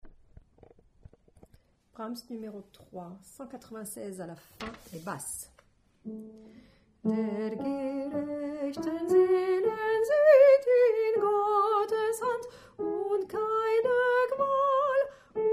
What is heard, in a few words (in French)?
fin Basse